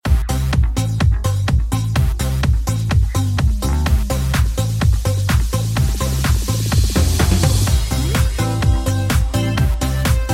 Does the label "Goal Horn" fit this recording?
No